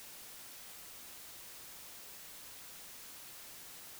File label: Castanets noise